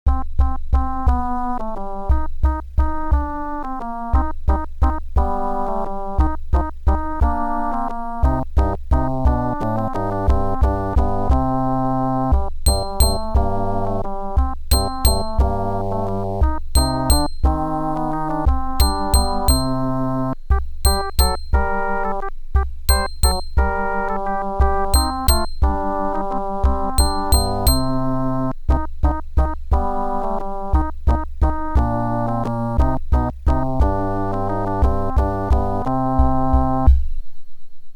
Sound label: OPL3